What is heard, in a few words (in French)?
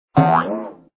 tombe